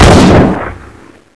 shotgun

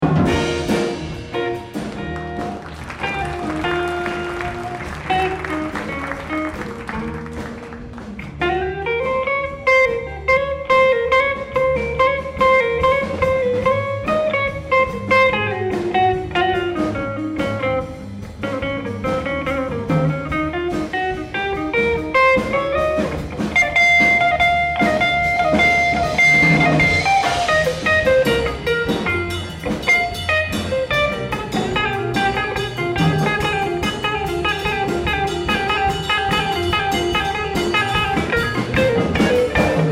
sidewinder solo

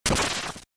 destroy block

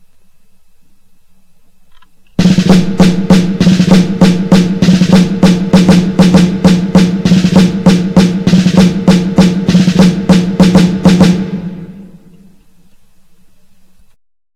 snare

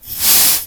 can spray; baldis basics

